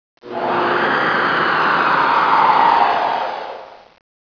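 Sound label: Hangar Ambience; XWing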